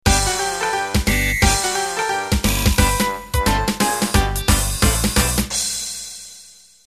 Flapper intro

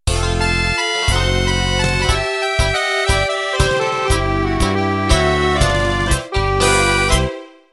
Tango Italiano End

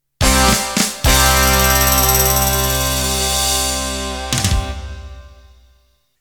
Shuffle End

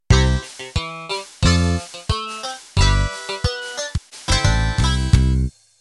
Newgrass End

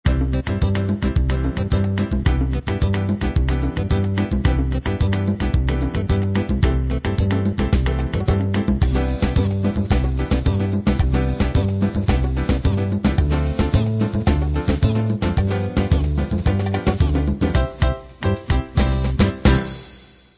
fast bossa

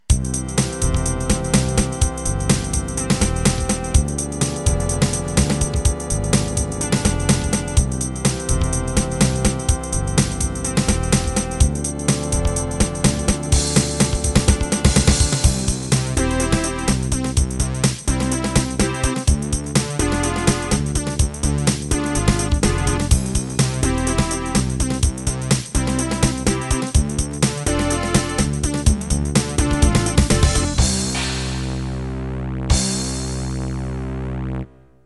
jungle

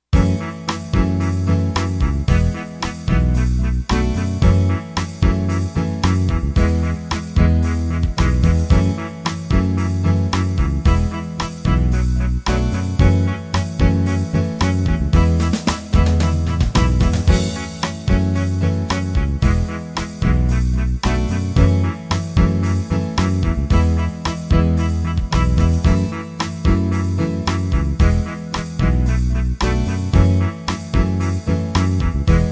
8beat rock

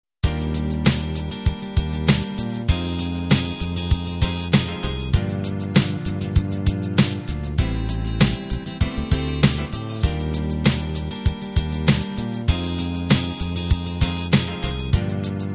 home beat

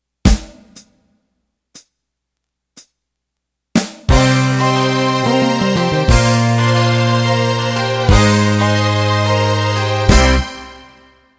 Country Shuffle End

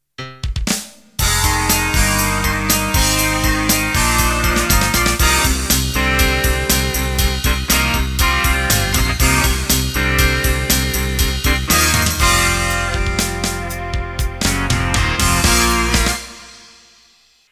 Heavy Rock Intro

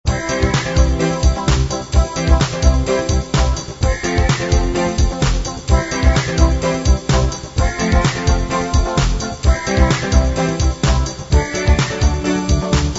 gospel

